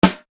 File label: REGAE SD, Yamaha RY-30